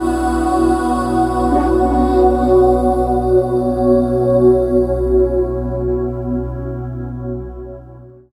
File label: PAD, Dance Mega Synth; Best Service; 90 s Sample CDs